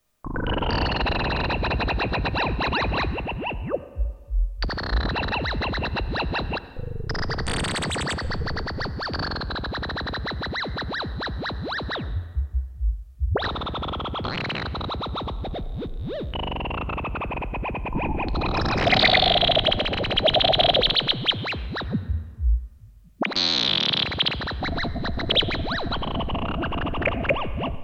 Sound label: JUNGLE BEASTS, Roland Jupiter-8; Factory patches